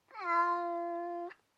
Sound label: kitty food; minecraft; technic